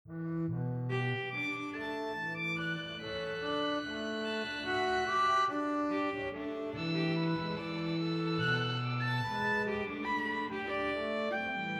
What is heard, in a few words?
Harmonic Glitz